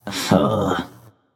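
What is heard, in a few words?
nonverbal ugh; smite; voicepack; merlin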